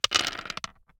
DICE on Hard Wood, Throw and Roll, Standard, 5 Five Dice; Samples; ArticulatedSounds; DICE Sfx Party (Extended)